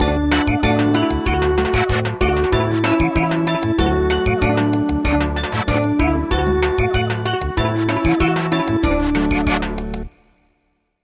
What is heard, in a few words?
Samba, midi traditional